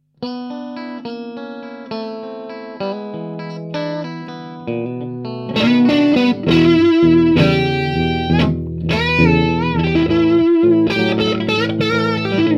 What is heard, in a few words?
true vintage mix, guitare; set TRUE VINTAGE